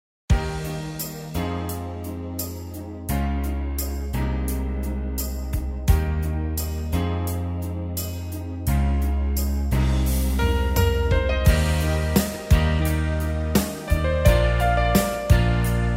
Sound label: Austrian Pop Style